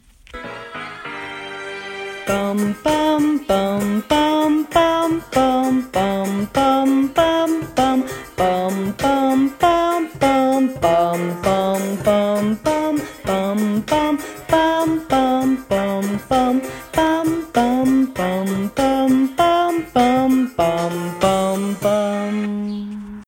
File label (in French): voix 3 basse; pupitre basses; enregistrements de travail